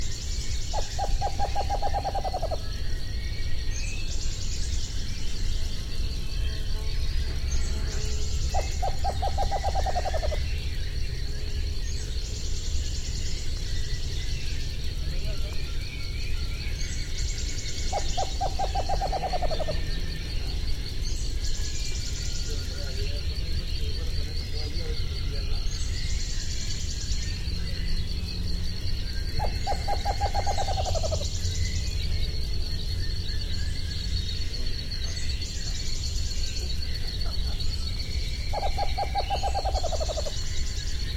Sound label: Megascops seductus, Balsas-schreeuwuil; uilen; Strigidae